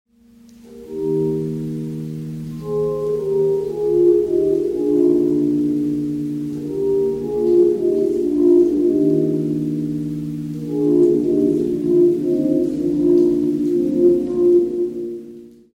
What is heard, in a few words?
great stalacpipe organ